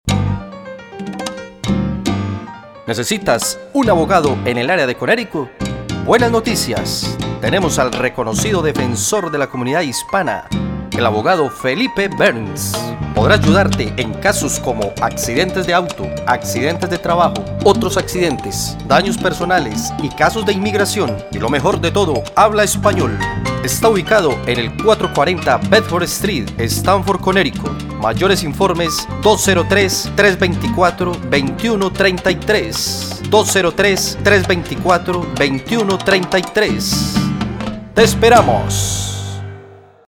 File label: jingle